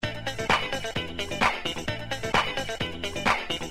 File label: drum loop